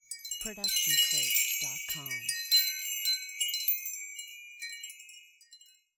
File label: Wind Chimes B